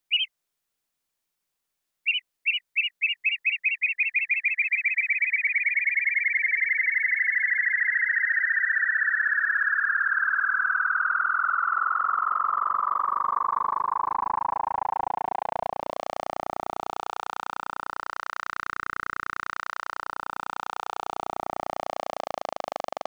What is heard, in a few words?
bird grains, synthesis